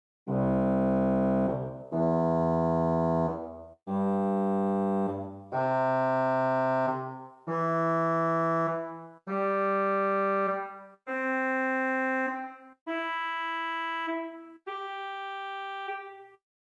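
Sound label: Pedal Trompete 8 arpeggio, organ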